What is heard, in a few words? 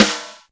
reggae snare drum; SNAREDRUMS